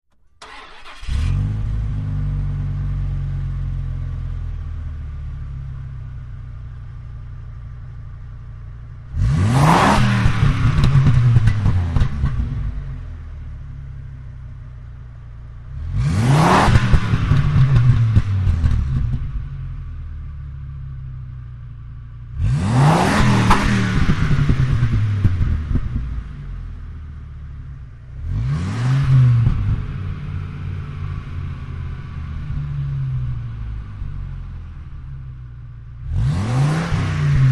Dodge Ram: Start, Big Revs And Off, With Mike Panning.